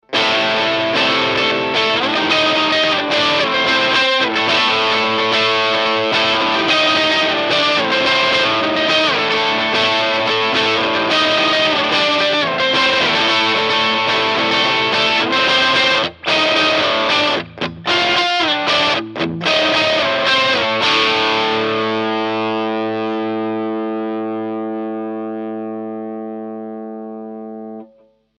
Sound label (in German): ESP MH 400 Lead Zerre